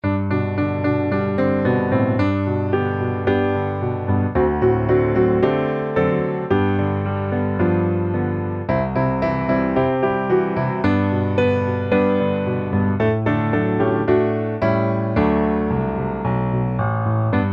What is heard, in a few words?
piano